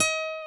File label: GTR, Nylon Chorus; Roland LCDP02 Guitar and Bass; Nylon String